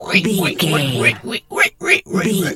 pig hog

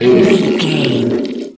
troll monster hurt pain long

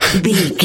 voice male breath loop single

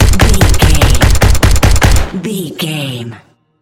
gun machine gun auto heavy shot automatic

